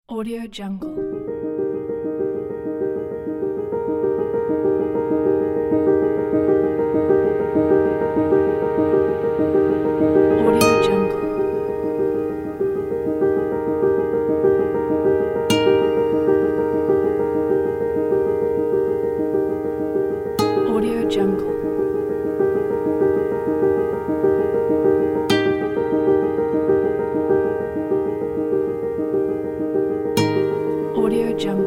emotional positive fond piano

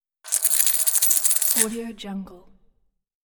shaking coins

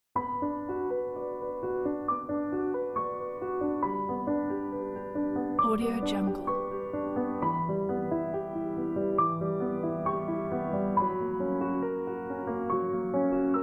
melancholy piano background

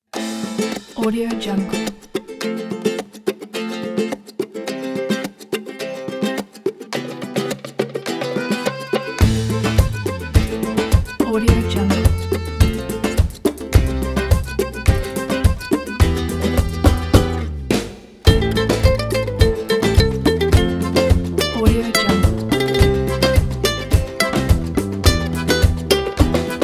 middle eastern ukulele accordion music

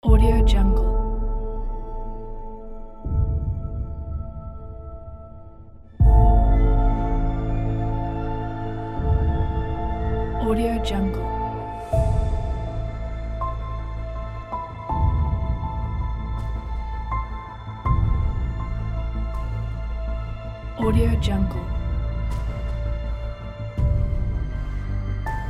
massive cinematic trailer

